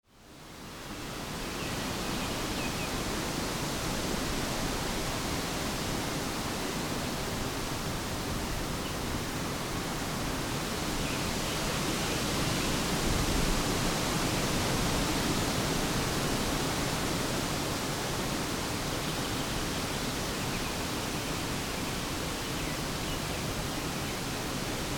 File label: wind in lime-trees